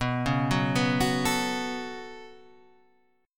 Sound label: B 7th Suspended 2nd B down Guitar Half Step Down